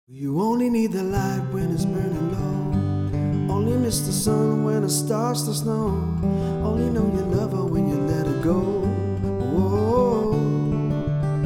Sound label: Solo Acoustic; English/Jamaican solo acoustic musician